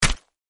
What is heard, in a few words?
fall; free rider hd